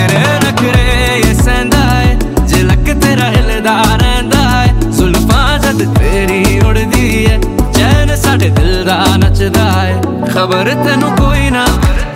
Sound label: Ringtone